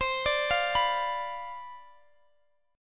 C Major 7th Suspended 2nd Suspended 4th C down Guitar DADGAD